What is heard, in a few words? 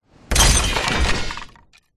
impact designed light whoosh into various junk objects crashing